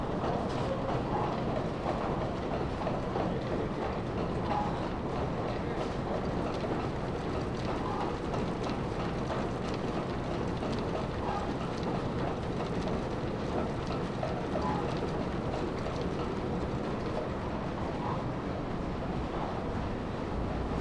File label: Basement belting atmo, mill